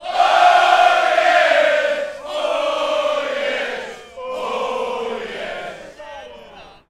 human crowd soccer x150 shout chant ohh yes x3 decending; sound effects one